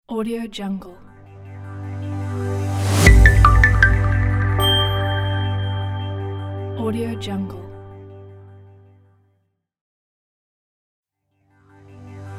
minimal business logo

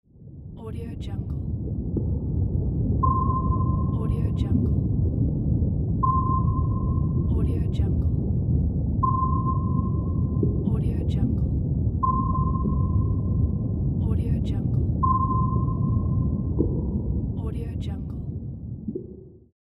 radar ping

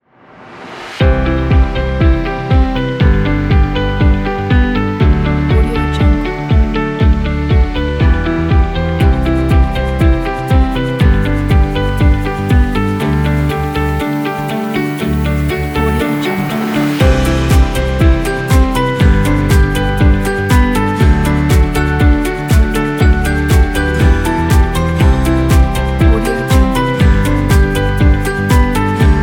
inspirational motivational corporate

